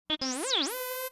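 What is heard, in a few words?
Droid, Responds to beeps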